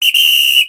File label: whistle; civ13; civ14; Items Whistle